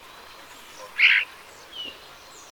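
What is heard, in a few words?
common starling vulgaris, flight call; doelln-see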